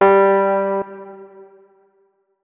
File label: notes, keyboard, electric piano; Hideout Furniture